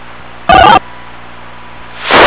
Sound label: dtmf superfast; genave